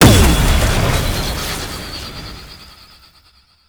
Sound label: Knight Plunge Ability Shockwave; Warframe; Tau; Tau Dax; Abilities